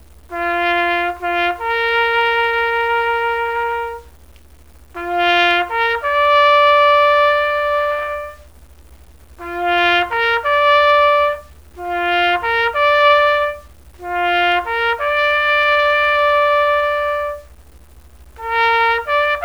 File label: TAPS